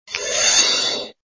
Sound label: Rescue Pack Transformation; Go Diego Go Rainforest Adventure; Flash